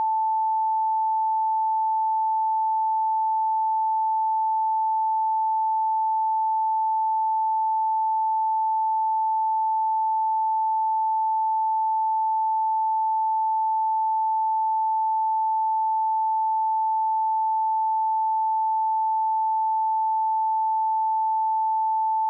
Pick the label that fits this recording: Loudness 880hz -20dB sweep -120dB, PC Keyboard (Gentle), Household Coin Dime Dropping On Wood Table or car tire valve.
Loudness 880hz -20dB sweep -120dB